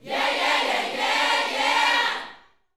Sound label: YOUTH CH PHRASE, Vocal Planet Gospel